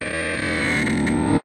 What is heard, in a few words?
Music Devourer Crack